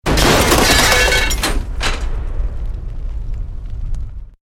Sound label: Explosion Medium Metal Glass; house, building or vehicle effects; Bombs; Created explosion effects; SoundEffects Foundation2